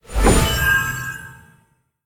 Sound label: heal; smite; olorun